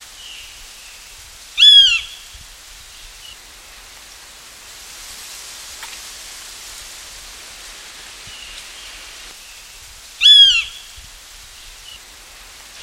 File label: Lesser Yellownape Picus chlorolophus